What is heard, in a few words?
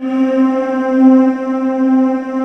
HOLD VOX C3, Choir; USB Soundscan vol.28 - Choir Acoustic & Synth; AKAI; HOLD VOXS